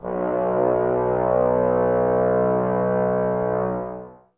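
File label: Brass trombones B; Miroslav Vitous - Woodwind Brass Ensemble; Tbs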